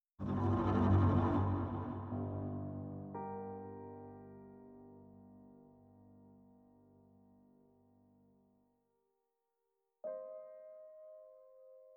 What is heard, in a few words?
Music FirstJump; Lucius III